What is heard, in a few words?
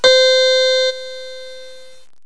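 HARPSICHORD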